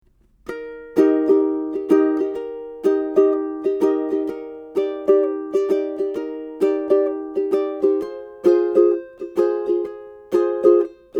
Strum, ukulele

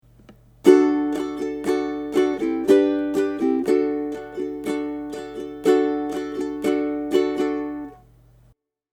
Love Strum, ukulele

